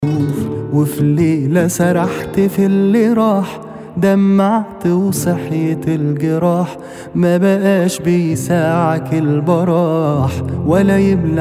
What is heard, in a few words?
acoustic